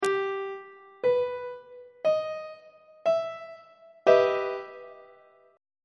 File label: d double sharp minor major 7th 1st inversion on treble clef